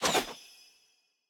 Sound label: banana throw; smite; raijin